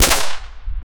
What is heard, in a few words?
ak 47 rifle firing sound